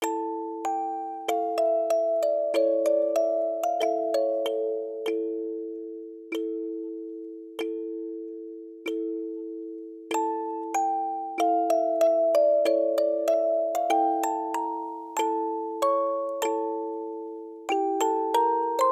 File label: Music Box